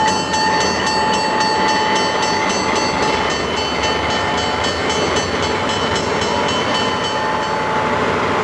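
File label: tiny train; Sound Library 2000; Wayzata Technology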